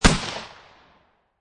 Shot Gun Mossburg 500 12 Gauge Single Shot Designed; Shotgun Sound Effects